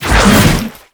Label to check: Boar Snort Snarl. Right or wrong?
Wrong